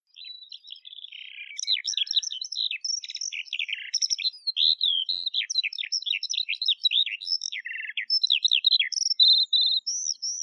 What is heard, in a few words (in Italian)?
allodola, birdsound